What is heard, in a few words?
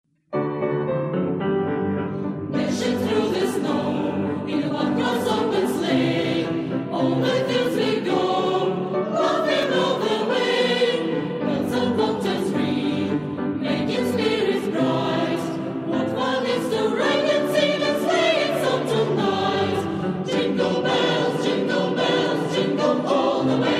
jingle bells